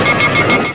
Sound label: lock; Super Games Pak (1991)(Odyssey)